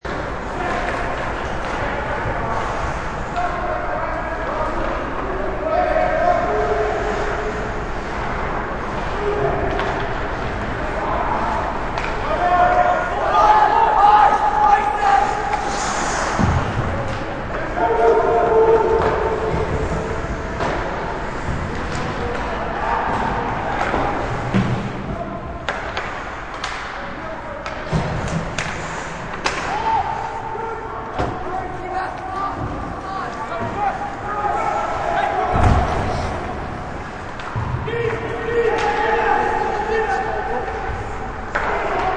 Hockey Match, Various sounds of a hockey match.; Sports

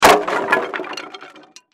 Crash Wood Drop On Cement; Sound Effects:Materials; Blastwave

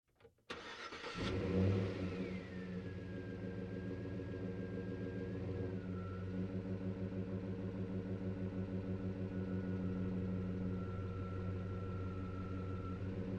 Truck GMC Savana Onboard Drive Accel Decel Slow; Trucks; Big Room Sound

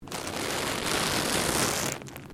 LEATHER MOVEMENT; Material components for constructing more complex sounds; Dressing (Sound Effects:Human)

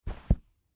Textbook Set Down, Textbook Set Down Carpet; Offices; Blastwave; Foley

